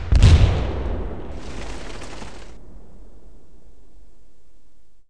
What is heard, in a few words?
DAMAGE; homm2